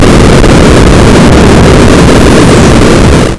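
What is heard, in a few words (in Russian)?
битный взрыв; mozhet bit sgenerirovan i zagruzhen v jsfxr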